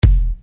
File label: Bass Dr, EuroDance Bdrums